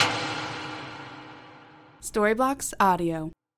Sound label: slow motion dramatic clock tick